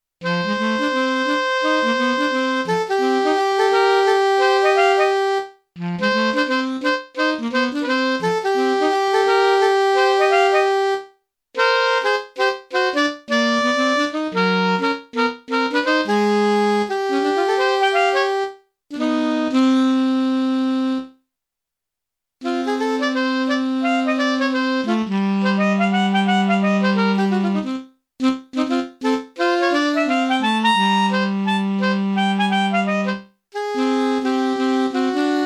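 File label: Alto Sax, STANDARDS ALTO SAX DUETS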